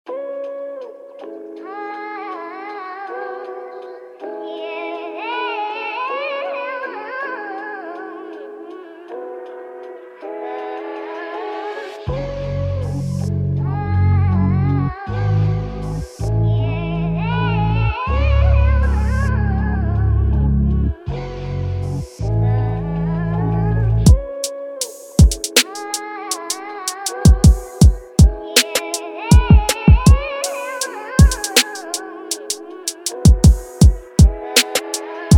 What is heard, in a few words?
Instrumental